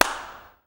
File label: CLAPS, SINGLE CLAPS; Best Service; World Colours